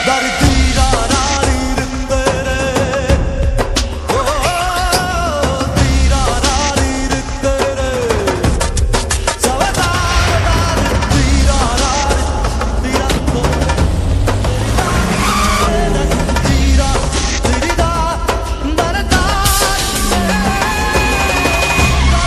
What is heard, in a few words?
bollywood music ringtone